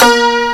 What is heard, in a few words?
PIZ TWANG; fairlight cmi